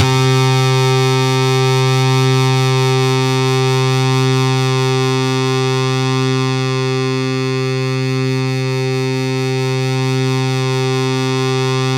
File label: C3, Synth Line; 90 s Sample CDs; NiceBeats; SynthLine VOL-33